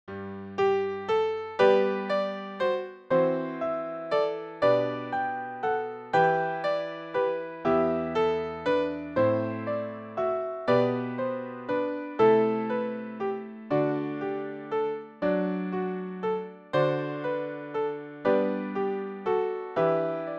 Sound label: Handbells Two Octaves; Doxology Jesus Joy Mans Desiring